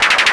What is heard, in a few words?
MS-20 FX up12; EMU PX-7